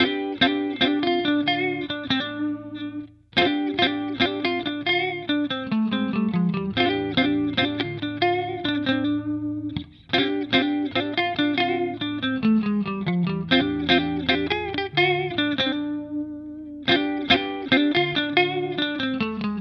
Fender both clean

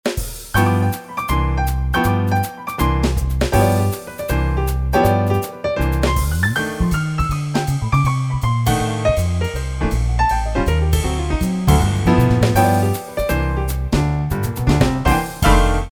SQ Piano

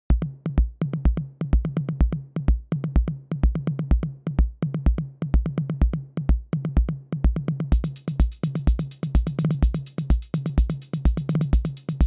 TR-606

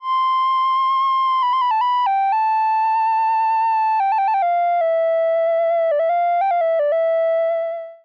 Aftertouch Vibrato

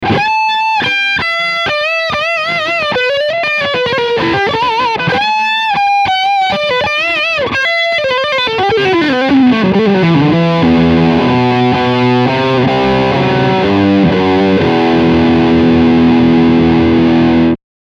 Humbucker Overdrive

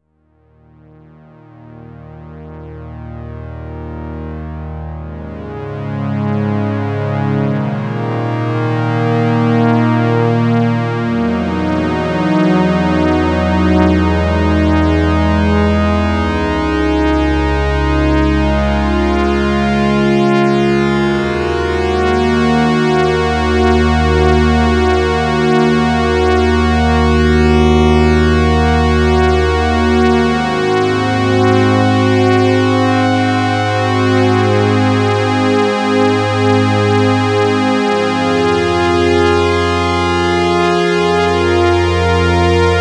OBSX Mid Strings

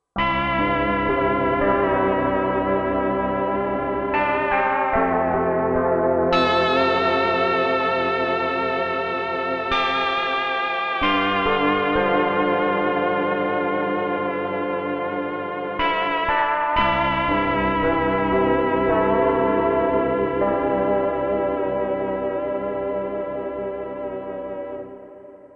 Bar Piano; Cypher2 KB Deckards

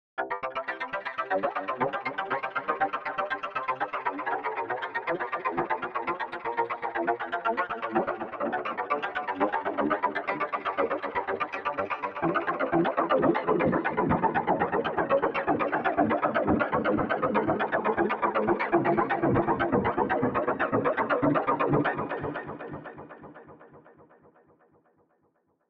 Arpeggiator

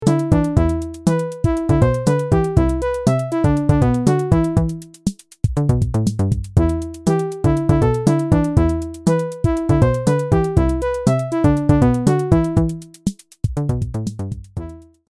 Clavia Nord Modular 4

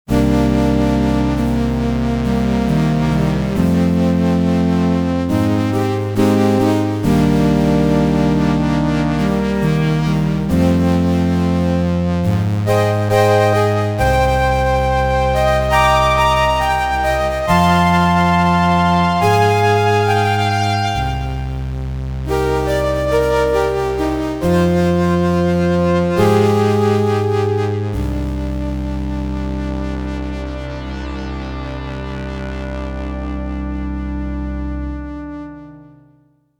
Elektron Digitone Airy Pad

